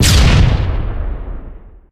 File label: explo medium, boomer explode; player; source engine; left 4 dead 2